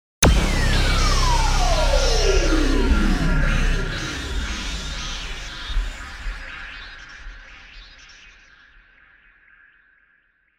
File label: FIZZY BUTTON BURST